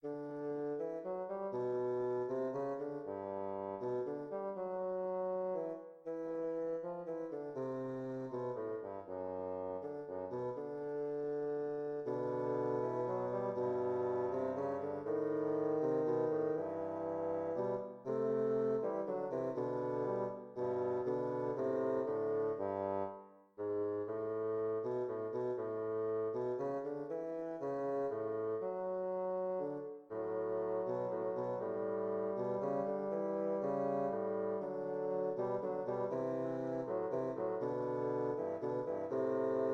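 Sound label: easy duo bassoon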